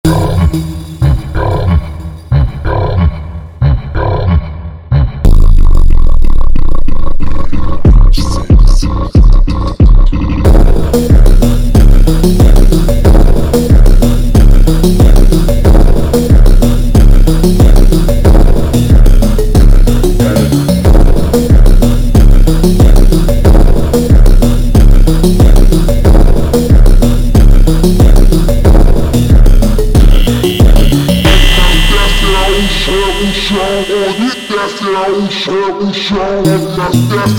Funk slowed

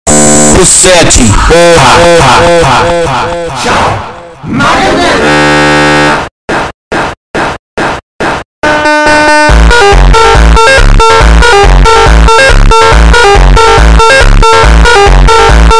FUNK bassboost

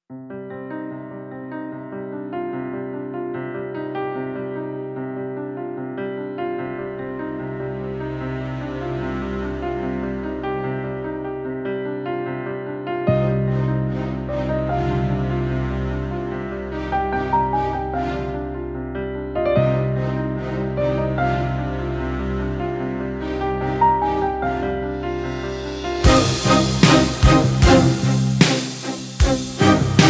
sad best

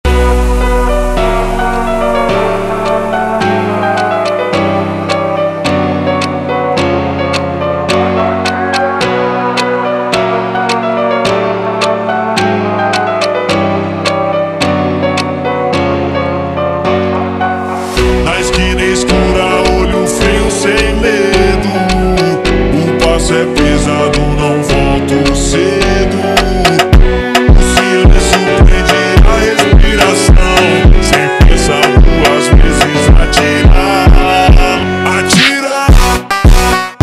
Batida super Slowed

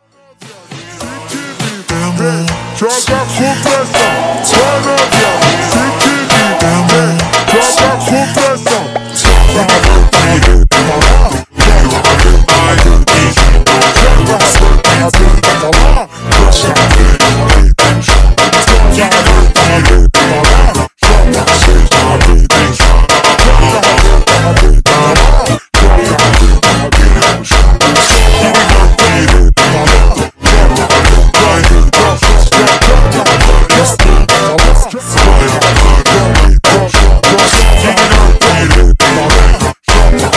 Funk ultra Slowed

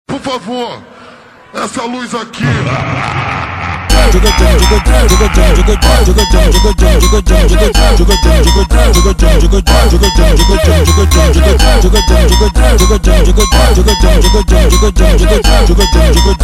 slowed, Brazilian Phonk

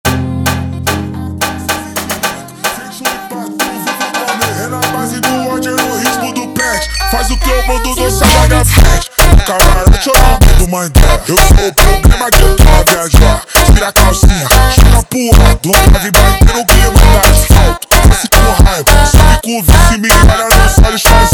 Funk ultra Slowed

